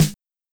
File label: Linn, LinnLinn Drums